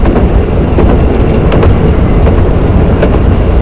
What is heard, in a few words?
run, Train; OpenBVE